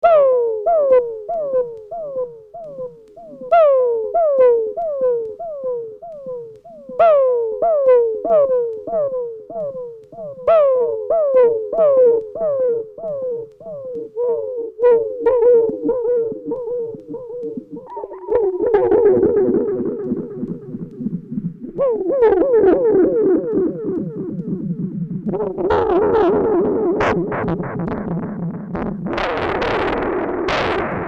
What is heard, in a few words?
Linxstar Devil Fish Delay FM